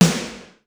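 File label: hall snare; sr16 sd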